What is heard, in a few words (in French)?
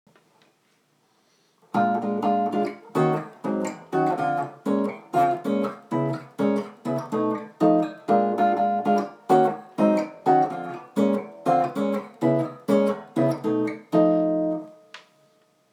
intro guitare voicings